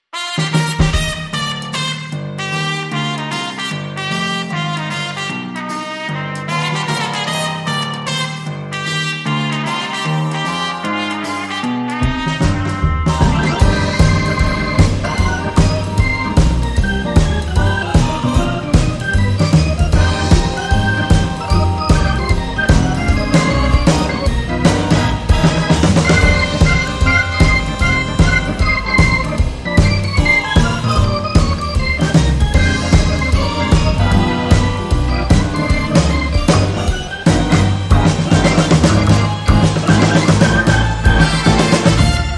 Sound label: Instrumental